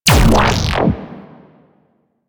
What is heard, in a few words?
Capital Ship Laser Fire; Warframe; Corpus